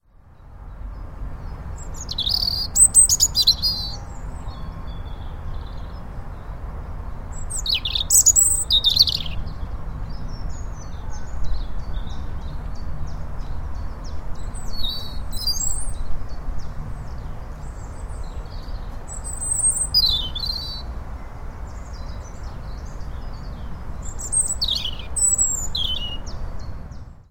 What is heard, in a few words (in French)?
Rougegorge familier